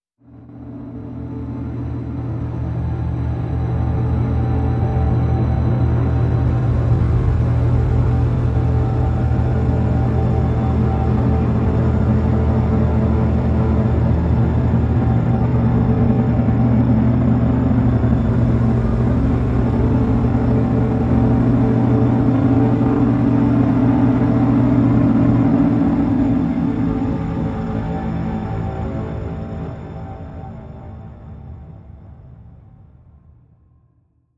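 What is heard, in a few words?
Drn A, drone; Fathomage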